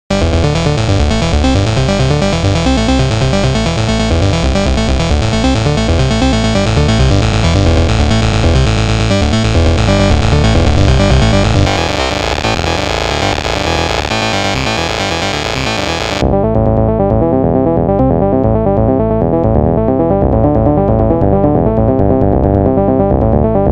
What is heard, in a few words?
Arpeggio Throb, Ondioline; Soniccouture